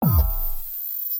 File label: Ring Blast Explosion